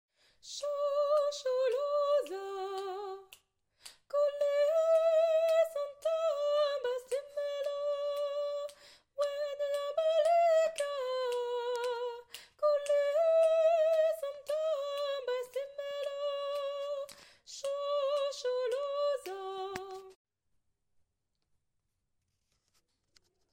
Shosholoza, T; Ténor

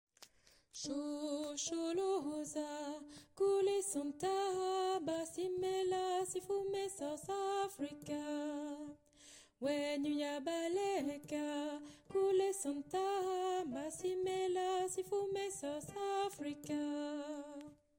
Shosholoza, A; Alto

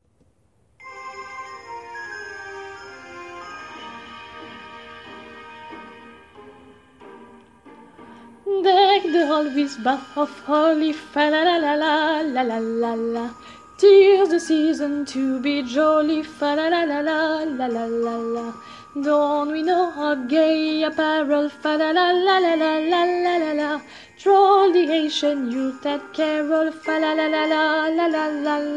Deck the Hall voix

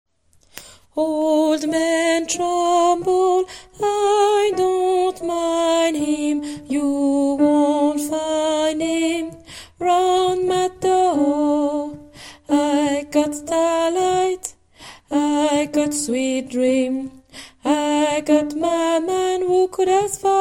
alto C-D

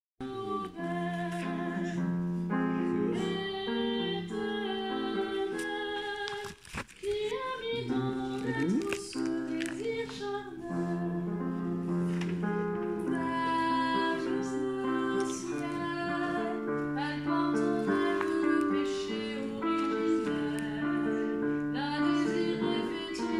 Belle alti

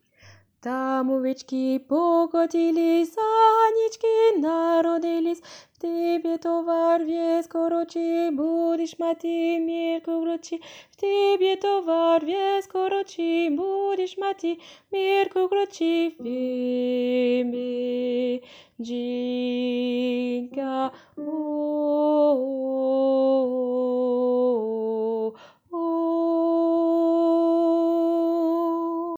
Schedryk Basse mi mineur

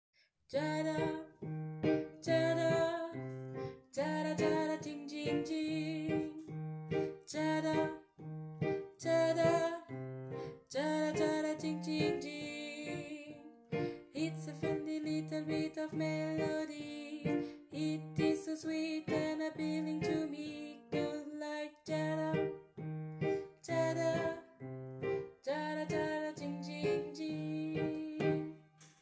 refrain - alto; de I à fin

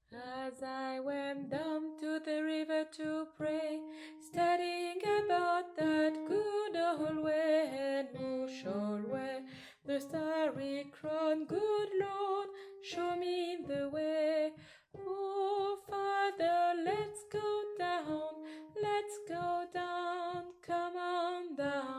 Down to the river (alto)

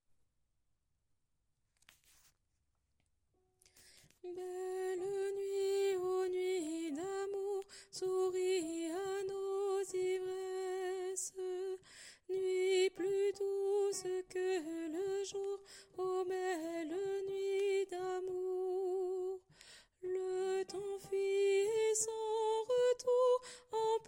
Barcarolle, Alto; alti; Mesures 1 à 28

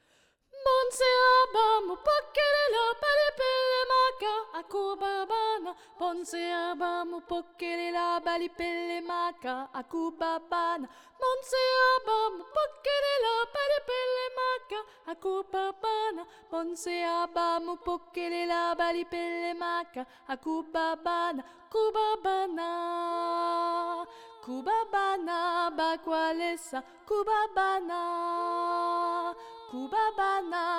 Bonse aba soprano

